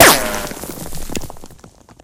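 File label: ric, ricochet; Left 4 Dead; Source Engine; weapons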